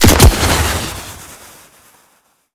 thermite impact; gmod; viper

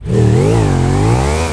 first, vehicles; gmod; tdmcars; gtav; bati

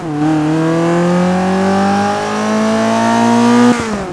second, vehicles; gmod; tdmcars; mx5

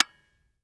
fire disconnector; gmod; viper

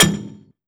frag physics bounce metal hard; gmod; viper

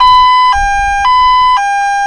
ambulance siren; gmod; lwsirens

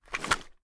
reload fast end; weapons; gmod; viper

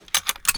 kilo98 reload load; gmod; viper

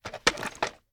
sn reload end; gmod; iw8; alpha50

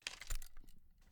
ar idle active move, weapons; gmod; viper

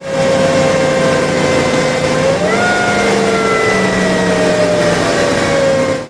throttle off, vehicles

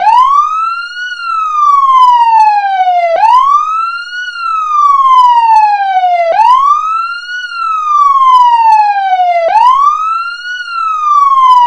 siren, taurus; vehicles

